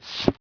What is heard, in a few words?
slider